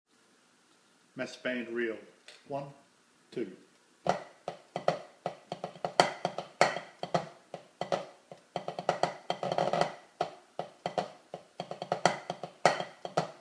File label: Massed Band Reel, pipe band